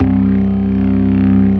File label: STOPS, ORGAN; Keith Emerson Signature Series